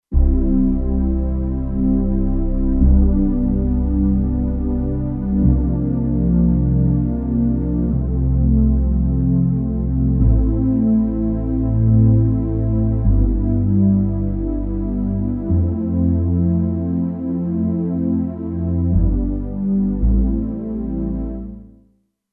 In the Air, Kurzweil K2500X; Factory Programs

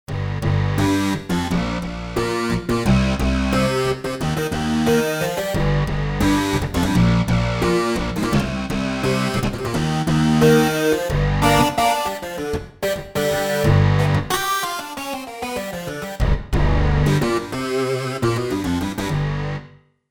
Dist Line, Roland JV-880; Factory Patches

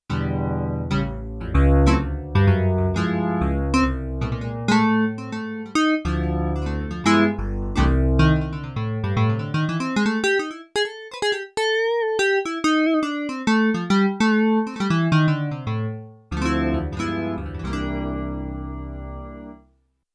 JAZZ GUITAR; Yamaha TX16W; Jazz Performance

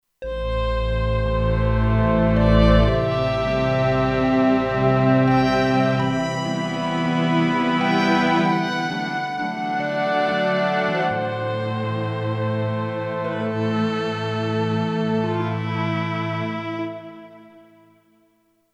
Slo Ensemble, Kurzweil K2500X; Factory Programs

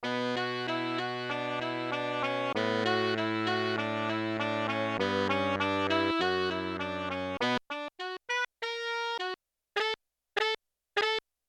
Alto & Soprano, Yamaha TX16W

